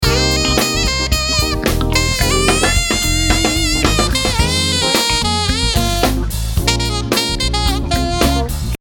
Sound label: sax impro